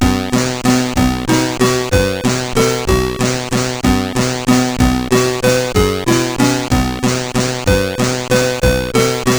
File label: Folkmusik, sid 8580